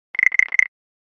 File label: harlequin toad idle action; Grow and go